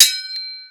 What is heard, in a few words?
sword